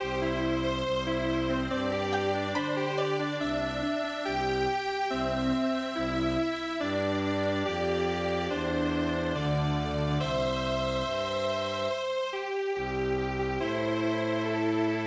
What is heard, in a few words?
strings; Oshogatsu